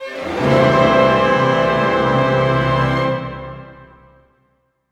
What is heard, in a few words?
GLIS C MAJ, gliss major, orchestra; Sound On Sound - Peter Siedleczek's Orchestra